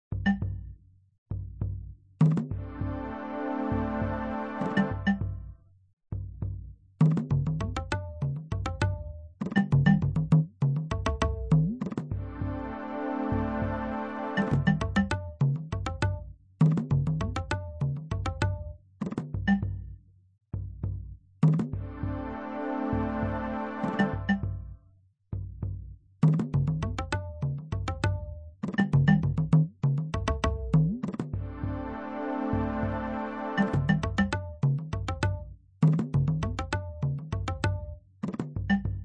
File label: Foggy Rain Ambient; Rayman2